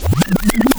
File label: SCRATCHES; Scratch 1